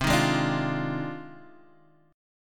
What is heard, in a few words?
F Minor 13th, guitar chord